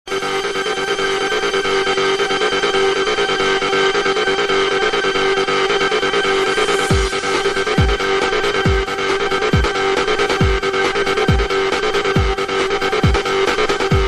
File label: beat